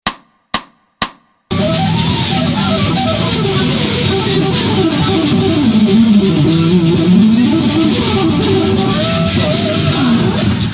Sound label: picking fast